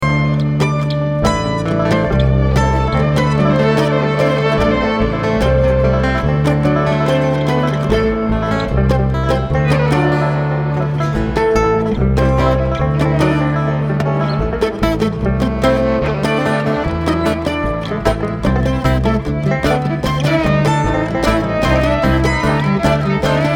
Traffic Jam

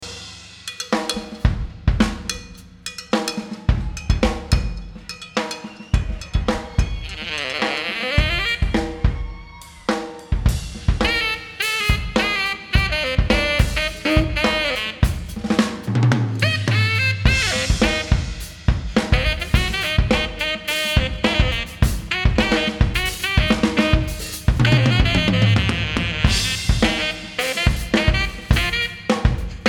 Drums And Sax

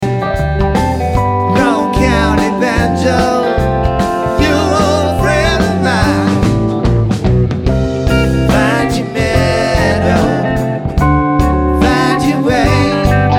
Banjo

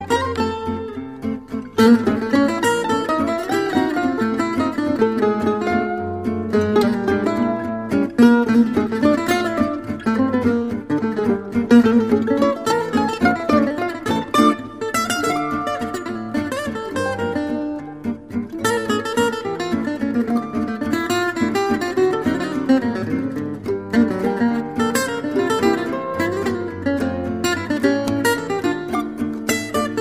Musette

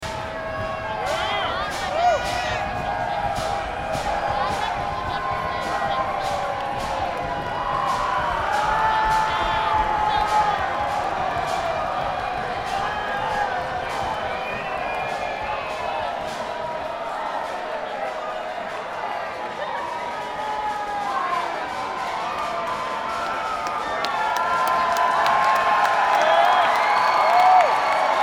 Crowd

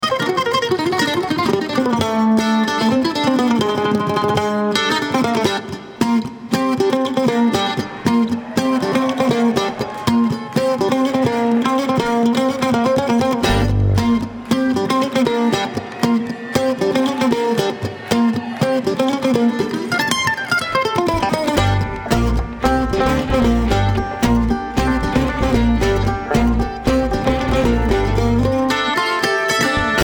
Kentucky Mandolin

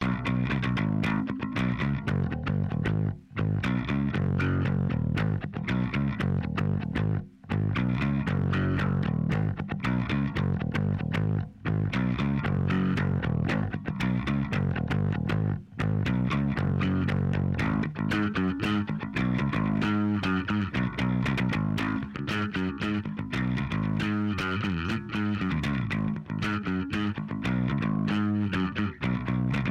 Bass Guitar Doodle

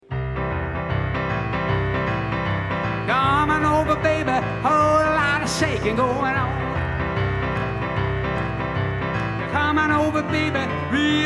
Piano Moment

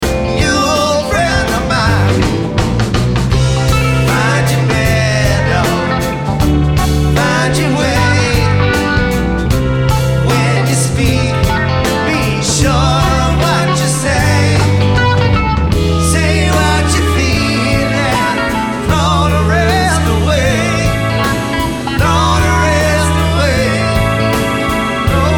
Banjo

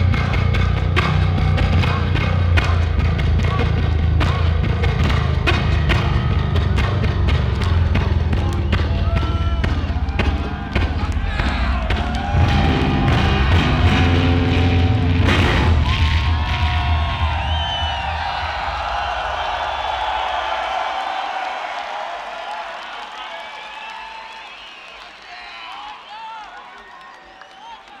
Bass Solo

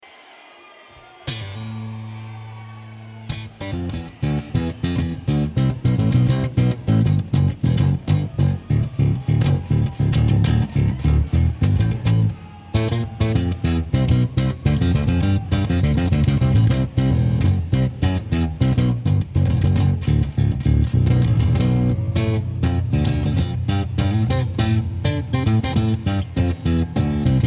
Bass Solo

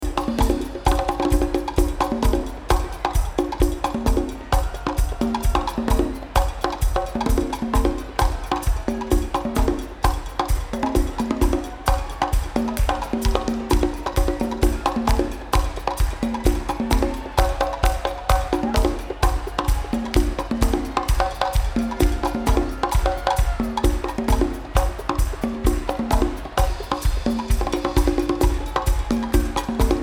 Drums

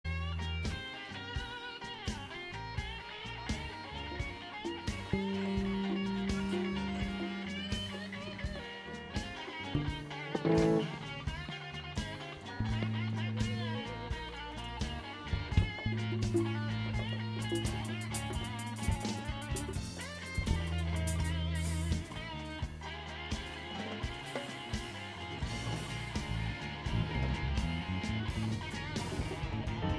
tuning intro

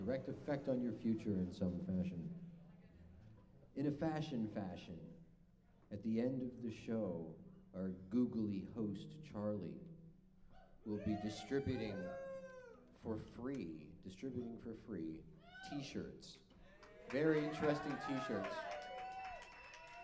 tuning

